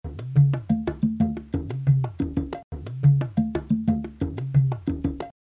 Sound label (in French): intro burundi; afrique